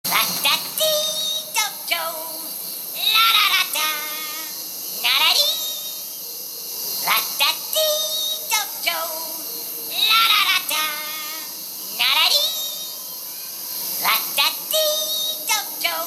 Grunt

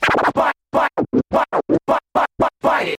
scratch

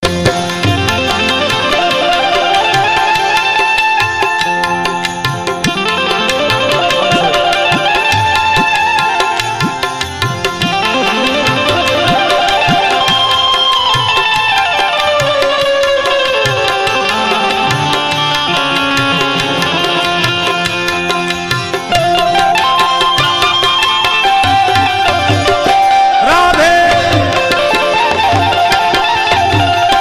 Bhajan Music

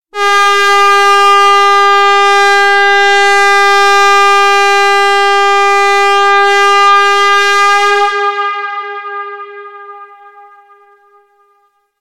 Train

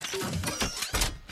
Robo-Sound

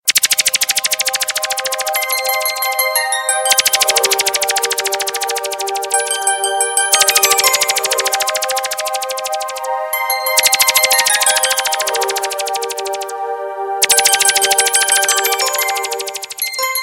Ring With Laser Mix

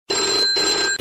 Msg Tone

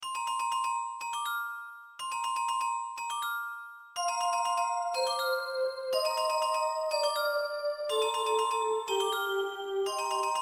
Iphone4 Trio Ring

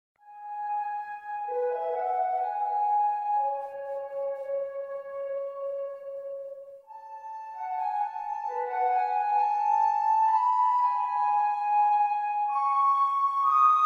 Ocarina; Tapion

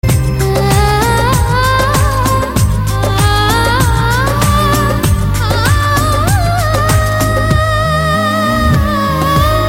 Vocal

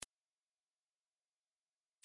Mosquito Buzz

